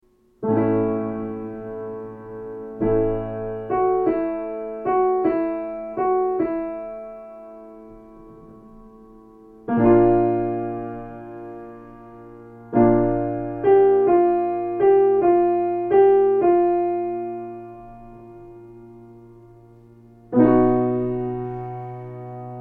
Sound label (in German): Klavier